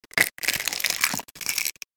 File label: Smashing Egg